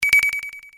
moon scanner; Cosmic Rage; The Experience-light

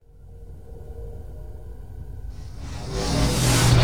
draw, salvaging; Cosmic Rage; The Experience-light; ships

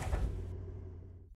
off, car; NovaSounds; NovaRealm

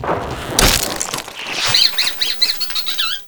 rat appear; Cosmic Rage; The Experience-light; ships; Ratika

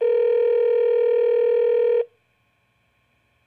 ring, telephone; Cosmic Rage; The Experience-light

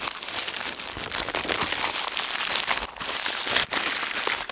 wrap; Cosmic Rage; hunt

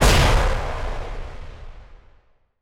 hit; Cosmic Rage; ships

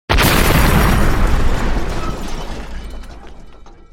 explode; Cosmic Rage; PlanetChunks

